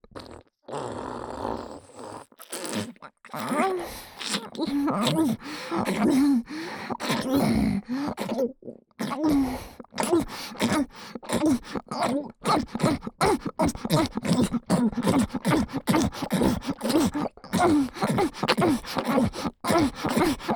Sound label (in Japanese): 妖艶 喉奥左耳フェラ, ASMR; フェラ素材